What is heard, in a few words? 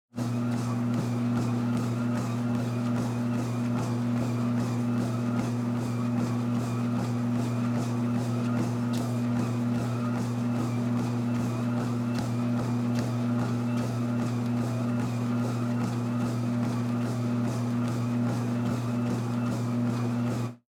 Treadmill Run One Person, Household